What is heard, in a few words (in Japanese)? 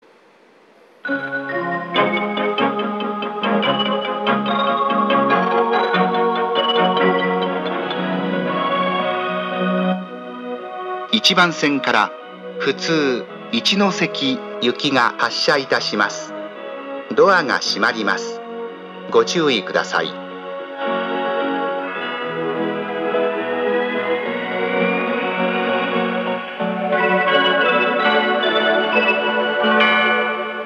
1番線発車メロディー, 仙台駅